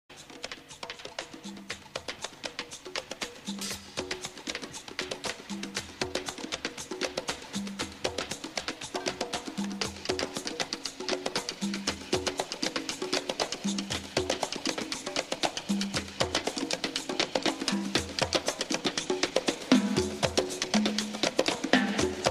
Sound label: Laughing Drums